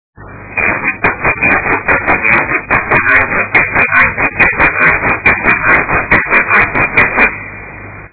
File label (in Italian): guiro; il suono vero delle foglie di Montenero; IL RUMORE DELLE FOGLIE D'AUTUNNO